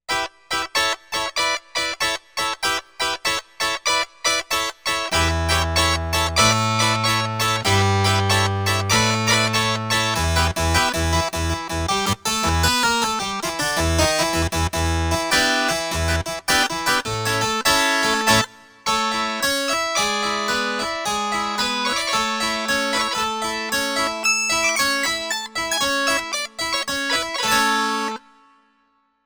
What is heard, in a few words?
Double Stop